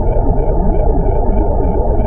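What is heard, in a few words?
Ambience Sci-fi Energy Loop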